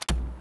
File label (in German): klick